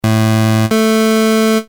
squ

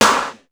shot room; sr16 sd

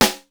dyno rim; sr16 sd

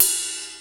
ride cymbal; hr16

